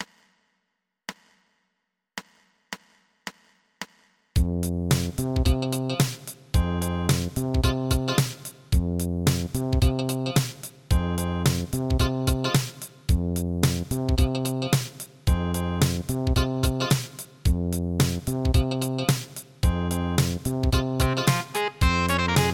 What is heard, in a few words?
Funky Septet